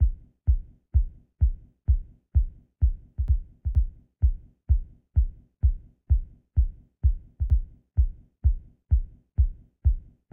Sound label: KICK